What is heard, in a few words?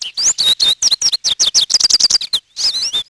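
A gldfinch, American goldfinch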